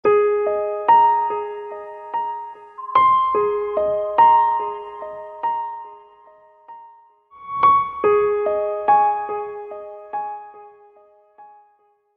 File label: Chilling Piano Tone